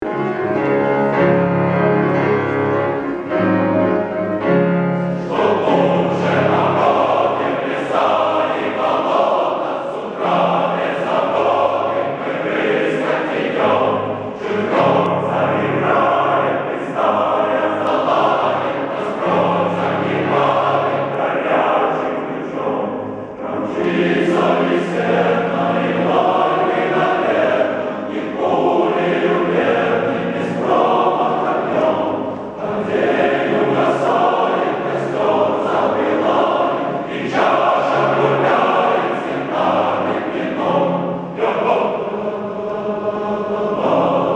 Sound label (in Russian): хор охотников